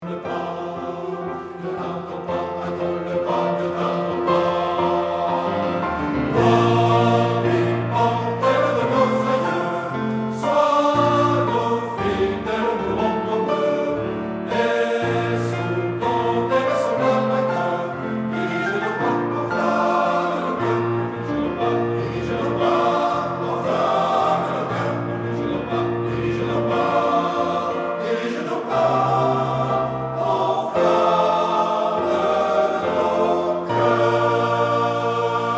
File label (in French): musique